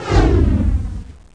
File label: whoosh; Total Chaos Nukem 3d Pack; duke